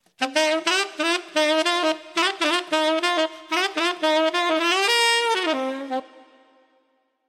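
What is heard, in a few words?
melody with repeating elements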